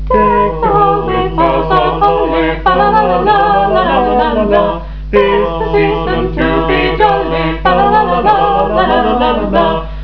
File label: Deck the halls; Christmas'93 Jigsaw Puzzle